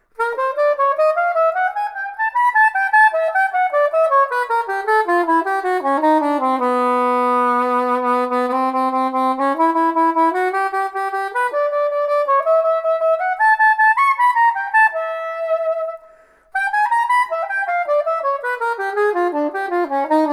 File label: Soprano Sax